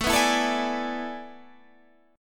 A Major 7th Suspended 2nd Suspended 4th, Guitar, Standard; strummed; capo 3; 17,14,14,14,15,16